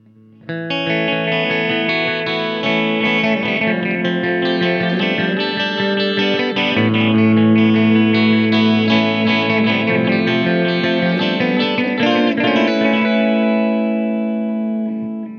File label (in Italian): Echo; MsDelayette